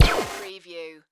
Hi-tech Panel Servo Button

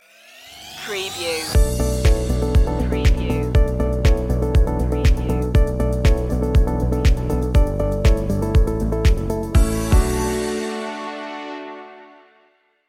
a perfect pitch stinger

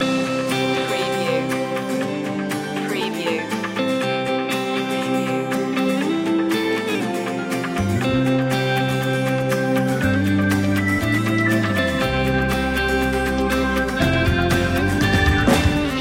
corporate fever loop

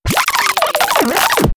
Turntable Scratching Reverse

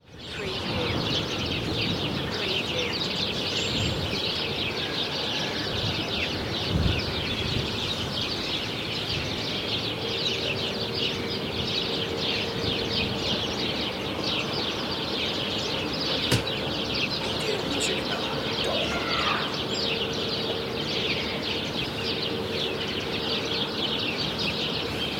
Farmland Ambience; Nature Sounds / Ambiences

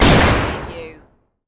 EXPLOSION CLOSE PERSPECTIVE SHARP BLAST

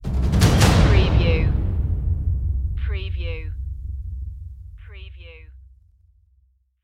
jungle ritual stinger

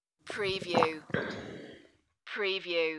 Swallow

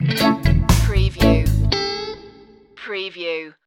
jamaica feeling stinger

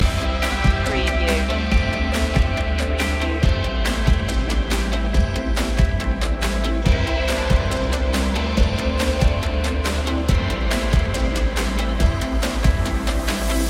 creative days loop

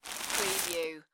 Bread Wrapper Open; sound effects bible

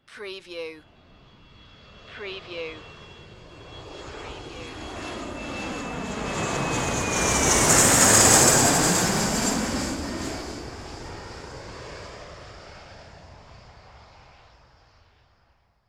Jet Pass By Small; Sound Effects Bible

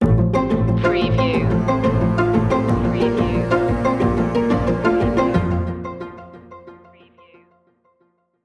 string theory stinger

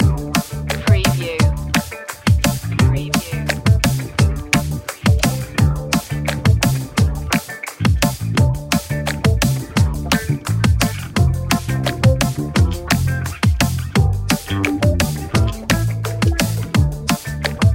urban funk loop

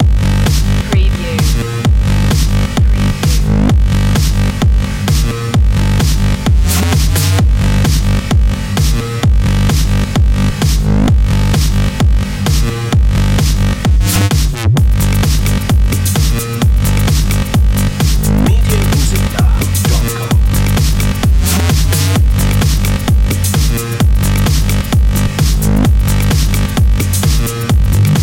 what da pluck loop

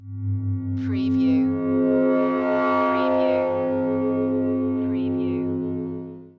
Space Scifi Sound - space scare

